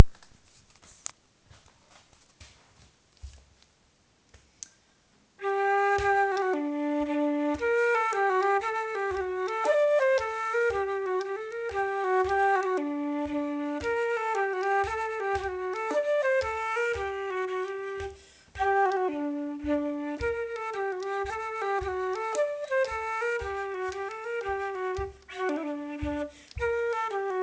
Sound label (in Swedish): Härjedals-jämtpolska; tyskens armar